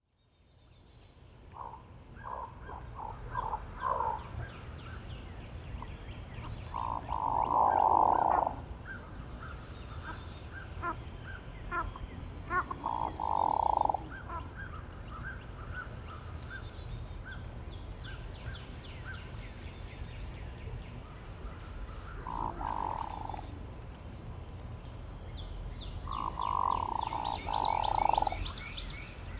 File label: Hooded Merganser Lophodytes cucullatus; waterfowl